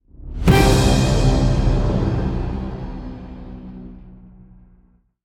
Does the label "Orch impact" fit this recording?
Yes